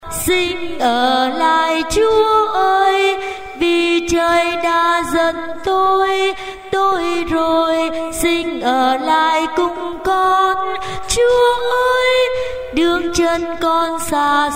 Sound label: alto